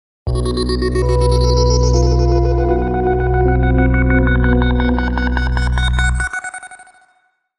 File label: spooky